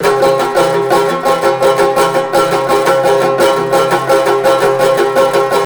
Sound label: banjo